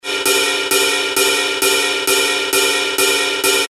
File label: Ride Loop (132 BPM); TECHNO